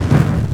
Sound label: fireball start; Doom; imp fireball